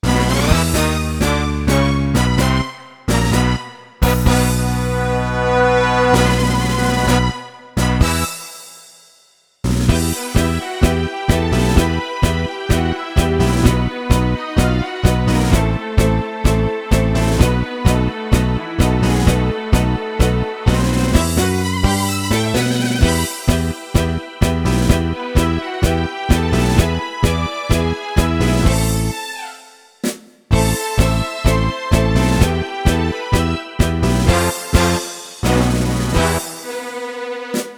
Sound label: EXPRESS TANGO; BULGARIAN